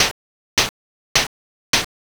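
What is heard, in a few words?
footsteps poolside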